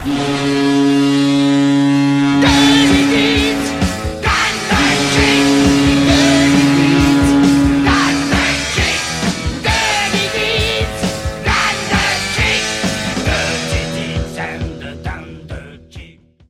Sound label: Aussie Goal Horn